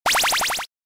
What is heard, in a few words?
Arcade 8 bit level up; Power Up and Level Up Sound Effects